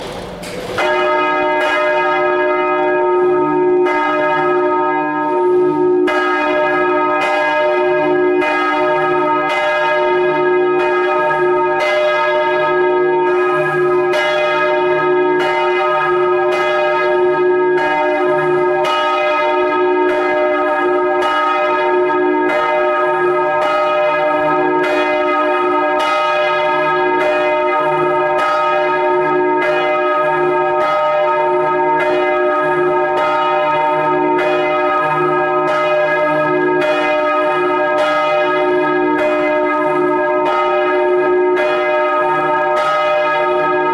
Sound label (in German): Glocke, glocken jakobi kirche; Anna-Katharina